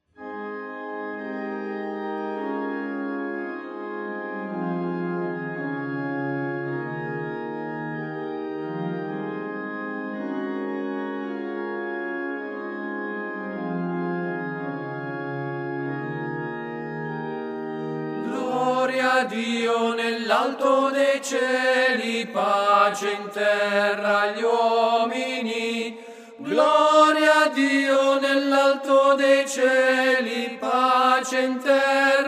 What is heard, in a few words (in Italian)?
tenori